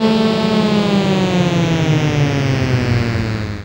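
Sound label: synth, Production Effects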